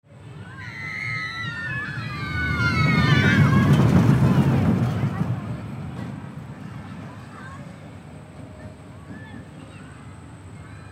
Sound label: rollercoaster ride